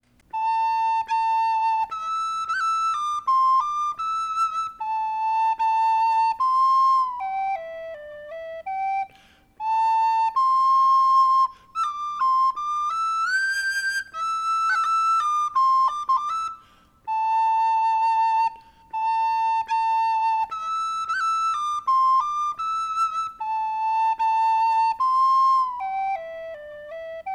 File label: Flageolet